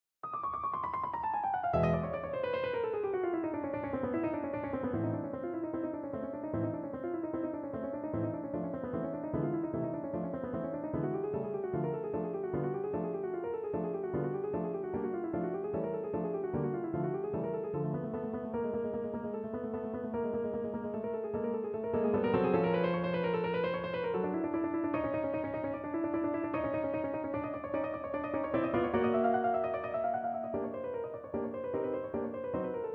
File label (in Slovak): Let čmeliaka; piano; Flight of the Bumblebee; Nikolaj Rimskij-Korsakov; Známe intermezzo z opery Rozprávka o cárovi Saltánovi zložil ruský skladateľ Nikolaj Andre